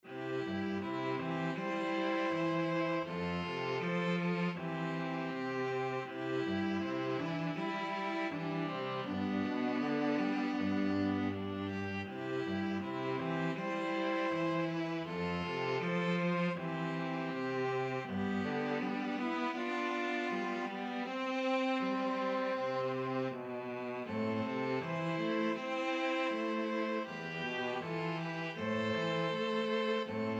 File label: string trio